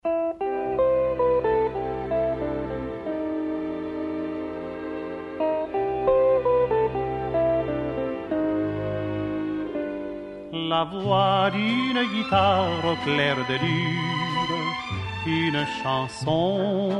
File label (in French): guitare; Un guitare au claire del lune